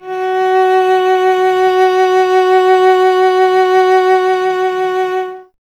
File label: Cello F#; Miroslav Vitous; Solo Instruments VOL-1